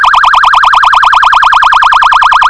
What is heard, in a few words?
Code3 Hyper Yelp